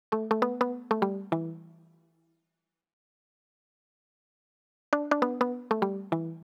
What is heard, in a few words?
Em 100 Guitar Muted